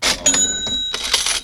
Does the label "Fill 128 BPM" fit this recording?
No